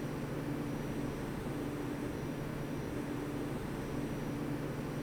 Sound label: Loop, Train; FCMB 2000; OpenBVE